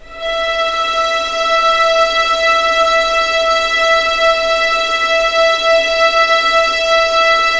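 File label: E5 legato mf violins R; East West; The Ultimate String Collection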